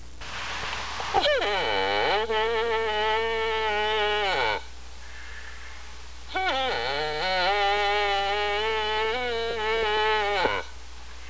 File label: moose